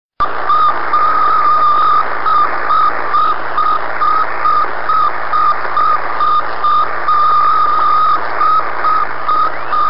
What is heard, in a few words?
Sputnik 1 Noise from Space